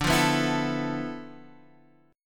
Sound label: D-Minor 6th Add 9th, D, Guitar, Half Step Down